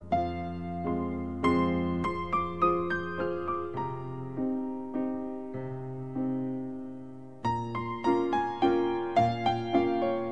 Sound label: relaxed piano ringtone